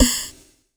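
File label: techno snare drum; USB Soundscan vol.11; Drums Dance & Groove; AKAI; TEKNO KIT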